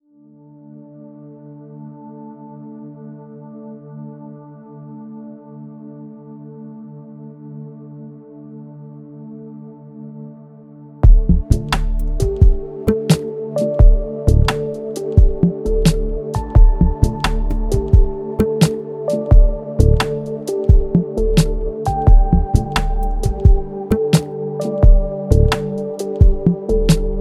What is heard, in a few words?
tabla